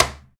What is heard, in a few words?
Cajon Hi, Percussion; Roland BK-5